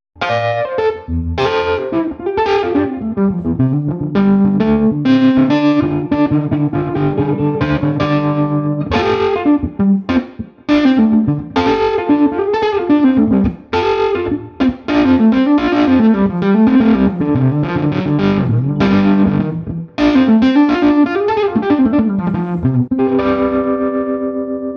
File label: SG Grind Tremolo; super 30